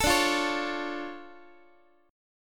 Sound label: D Minor Major 7th D down Guitar; x,x,12,12,9,12; DmM7 strummed; JGuitar